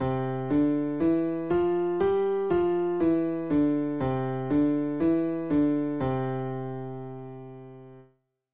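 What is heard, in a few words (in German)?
beide Hände, klavier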